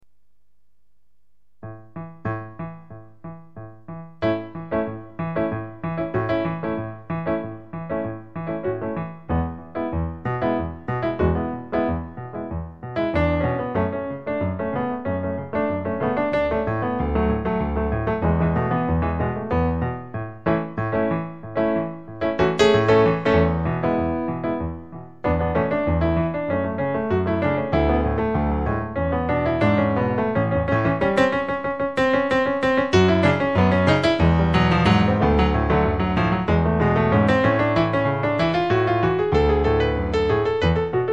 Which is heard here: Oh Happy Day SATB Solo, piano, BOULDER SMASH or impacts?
piano